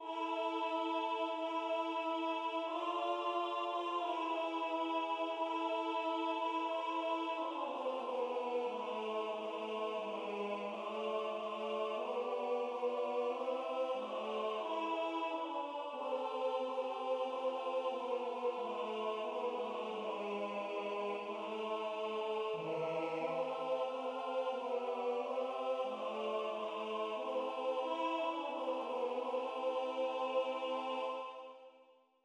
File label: Tenor; Schoenster Abestaern